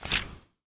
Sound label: page; perdachcraft; minecraft